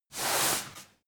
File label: broom sweep soft